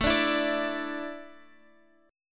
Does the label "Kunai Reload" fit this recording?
No